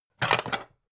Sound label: pan stack hard